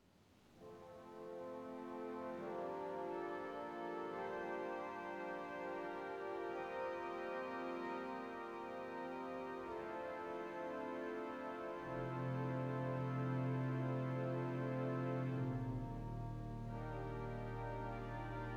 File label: celestes violes clarinet dulzian, organ; Celestes, Violes Starting on Sw all 4 strings & Ped Dulciana 16', @ 0:48 to Solo (with Sw; phoenix